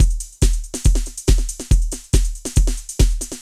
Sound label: techno 140